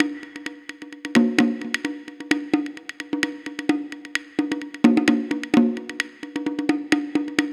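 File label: percussion mix 130 BPM, left; INIS - Opium; PERCS 130BPM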